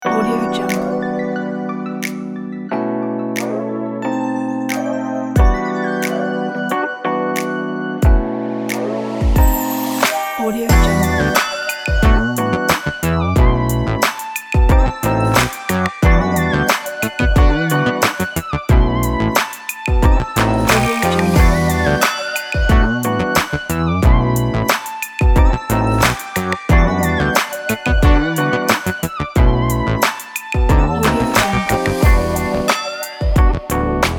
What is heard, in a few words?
g funk groove beat